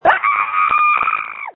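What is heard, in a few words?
Scream, phone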